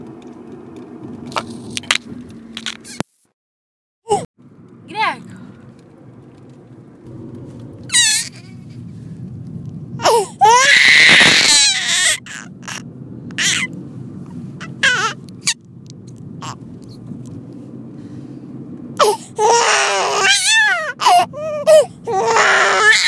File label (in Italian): Pianto